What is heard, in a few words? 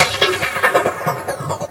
Loops Fills; Vengeance Samples